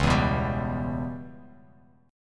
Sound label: C sharp Minor 7th Flat 5th C sharp Bass Standard; C#m7b5 strummed; 9,7,9,12; JGuitar